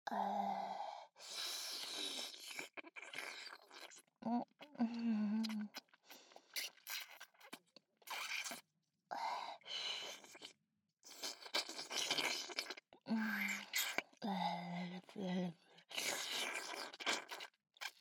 乳首舐め, ASMR

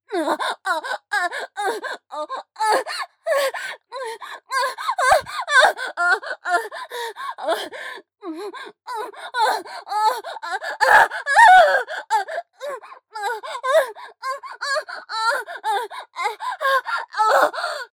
正常位・感情高ぶり喘ぎ・加速; ヘレナ

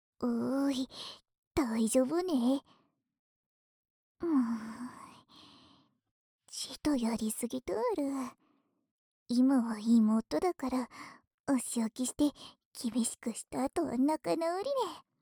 右 綿棒, ASMR

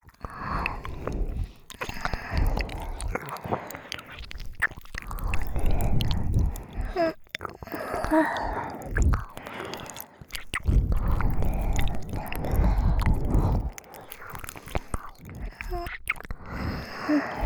ダブル耳舐め

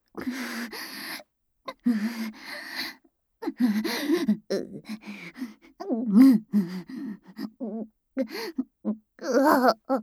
強がりイキ我慢道具攻め＆エロ吐息＆潮吹きループトラック, ASMR

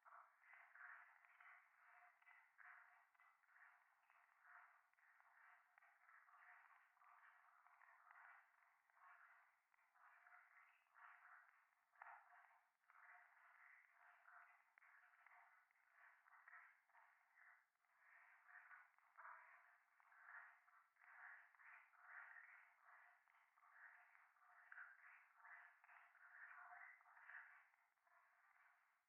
夢の中 フェラチオ 暖炉

